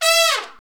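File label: brass trumpet; Roland L-CDX-03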